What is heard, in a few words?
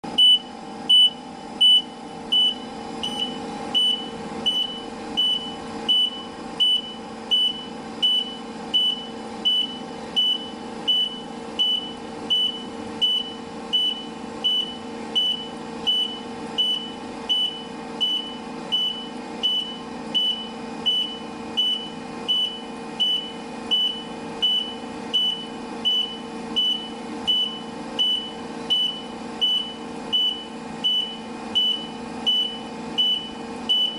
Reverse gear car